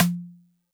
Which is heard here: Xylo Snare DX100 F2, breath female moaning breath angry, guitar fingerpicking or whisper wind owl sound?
Xylo Snare DX100 F2